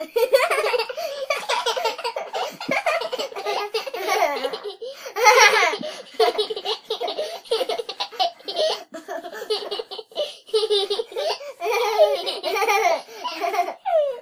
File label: 2 Kids Laughing